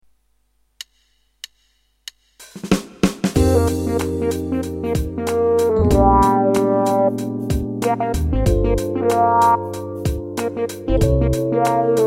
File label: YAMAHA